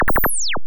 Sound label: Laser shot